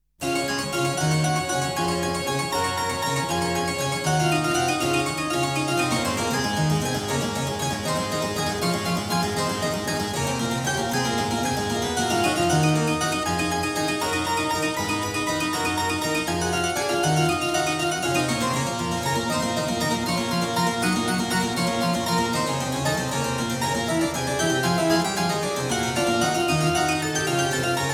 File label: Prelude in C-sharp major, clavier; Prelude & Fugue No.03; BWV 848; BACH; WELL TEMPERED CLAVIER; BOOK I